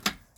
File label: clicky, buttons; sfx; Dungeons Of Kharadum